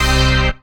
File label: dance chord